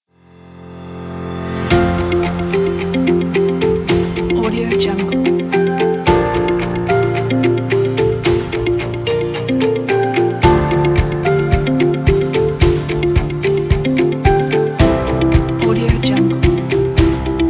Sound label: upbeat motivational